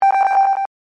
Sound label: Scifi, Communicator, Beep, Button, Chime, Science, Fiction; Communication